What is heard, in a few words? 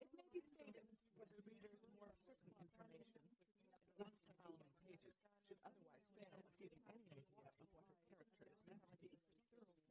female inst sim; SASSEC07